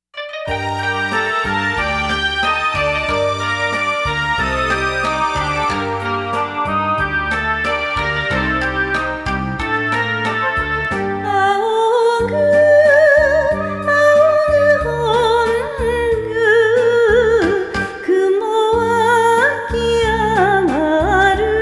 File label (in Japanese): ondo; ichinomiya